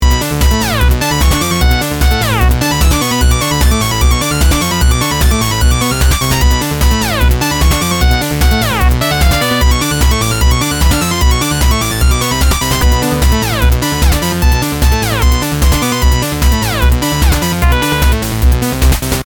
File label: Music Treasure Room